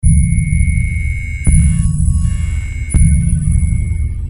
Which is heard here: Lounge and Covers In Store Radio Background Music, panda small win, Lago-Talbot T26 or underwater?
underwater